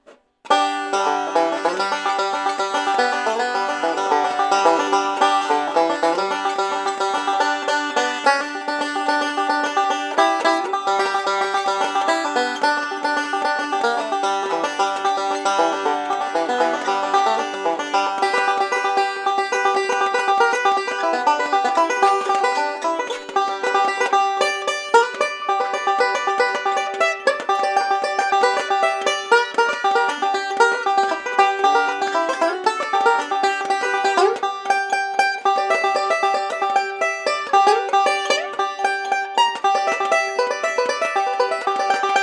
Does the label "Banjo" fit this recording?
Yes